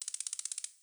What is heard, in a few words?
numbers rotate long